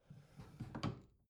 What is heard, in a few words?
bathroom drawer close soft